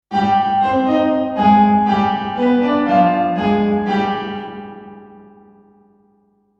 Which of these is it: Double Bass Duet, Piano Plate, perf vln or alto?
Piano Plate